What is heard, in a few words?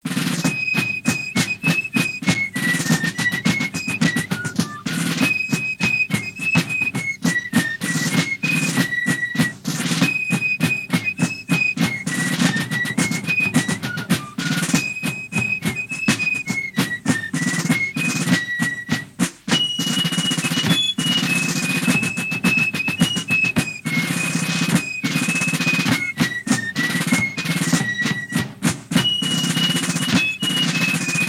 Fife and Drum